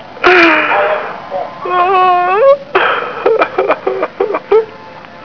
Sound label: cry